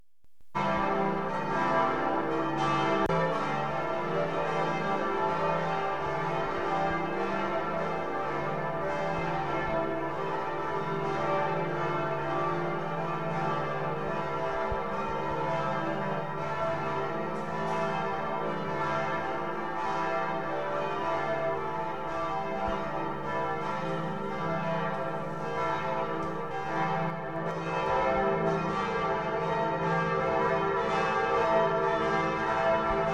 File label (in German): Alle Glocken, Frauenkirche Dresden